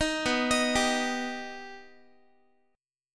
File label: B Augmented B Guitar, chord; arpeggiated; 18,19,18,17,x,x